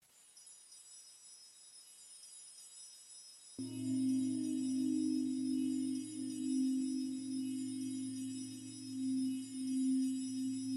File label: ambient with nature; nadejscie wiosny